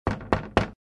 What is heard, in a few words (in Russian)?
zvuki stuka